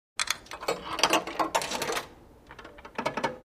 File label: Tossing a coin into a pay phone; telephone